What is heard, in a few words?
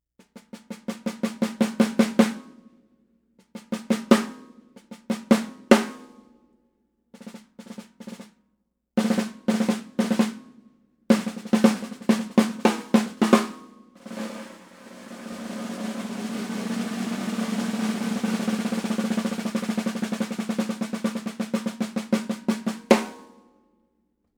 5x14 Acro, Ludwig